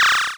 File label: forcefield